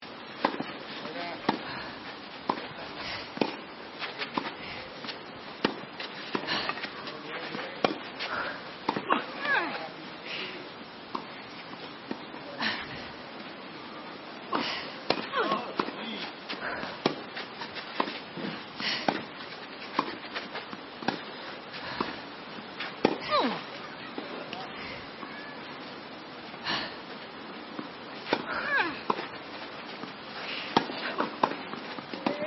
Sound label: Woman Tennis; Sport